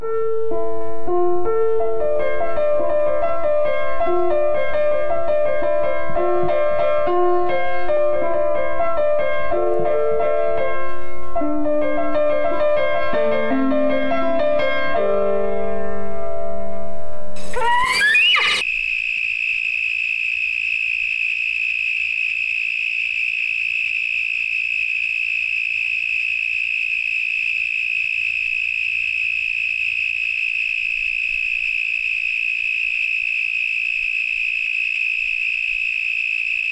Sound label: Summer night piano eagle cry